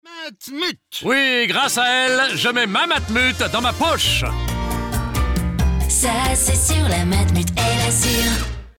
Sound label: Pub Radio Chant; Matmut